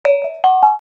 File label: Bonang